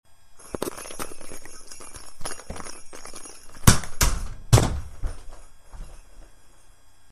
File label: bell football